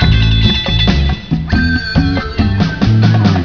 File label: Funky Bar; Total Distortion